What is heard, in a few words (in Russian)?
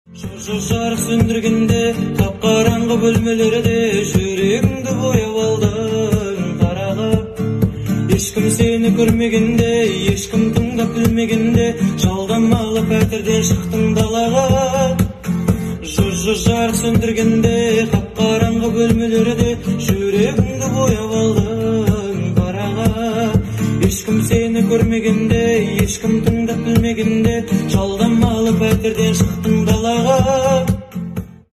solo gitara